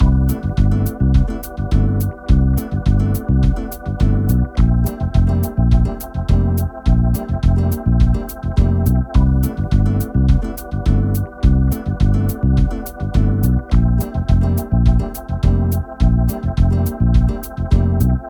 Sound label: Shop Music; Five Nights at Freddys; FNAF Special Delivery; android ios